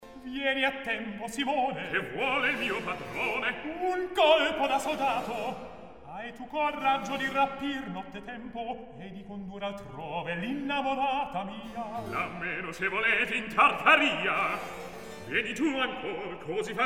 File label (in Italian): Recitativo; Vieni a tempo Simone; Mozart; La Finta Semplice